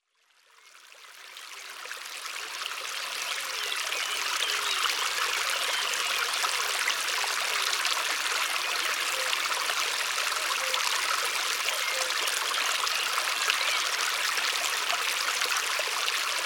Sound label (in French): Chants de l'eau Rivière